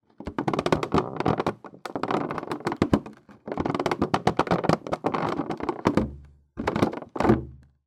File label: foley plastic tub pressure creak; zapsplat